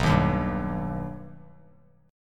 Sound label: B Augmented B Bass Standard, chord; strummed; JGuitar